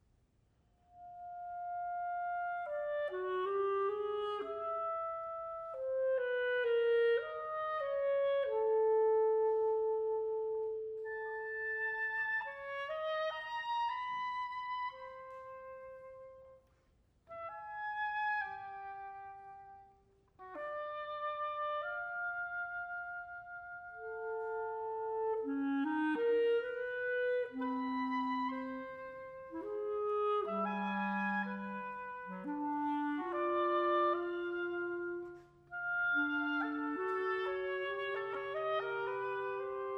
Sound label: duo for oboe and clarinet andante affettuoso; riegger